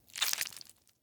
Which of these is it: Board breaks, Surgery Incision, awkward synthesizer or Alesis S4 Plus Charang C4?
Surgery Incision